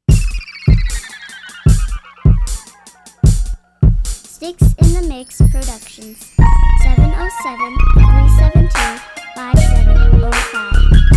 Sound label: soul piano